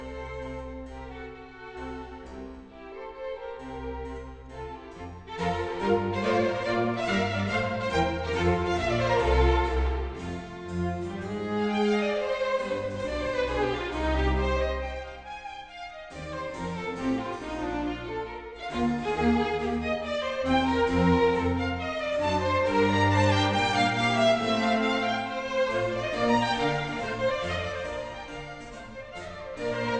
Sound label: classical